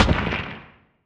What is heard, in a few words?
asteroid damage carbonic small; Factorio